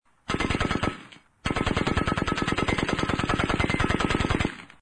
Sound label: machine gun